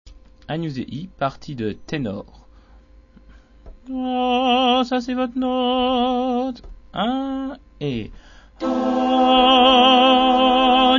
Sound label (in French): Messe Monteverdi Agnus tenors